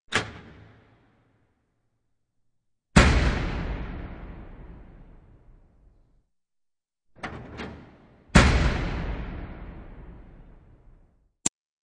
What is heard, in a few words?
Track, Doors; 헐리웃특수효과음; 문 소리